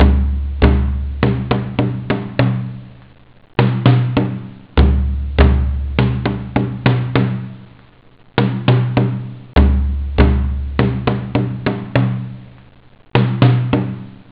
bongo, house; OctaMED SoundStudio V1

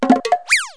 bonks zip, cartoon accents; KABOOM! - Wild and Wacky Sound Software

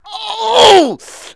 PAIN LEG, KICKED; Messiah

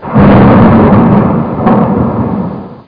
Big Deth Thunder; Ultima III; Mac Magazin; MacEasy

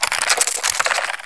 growl, insect; Heretic II Demo; monsters

